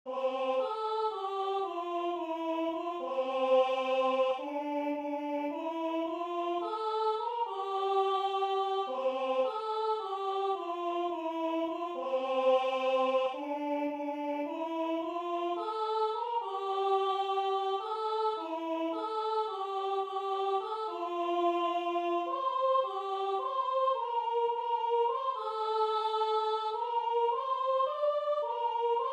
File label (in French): Je puis tout par Jésus Christ soprano